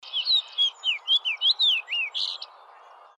fox sparrow; around Madison 4/10/2003; (With the noisy Beltline in the background)

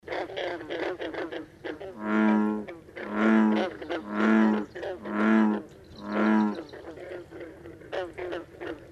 bullfrog; Bullfrog (at Horicon Marsh); Nightsounds 6/17

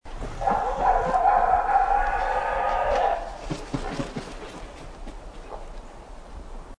great blue heron; Great Blue Heron, Devil's Lake SP 4/07/04; Great Blue Heron MP3

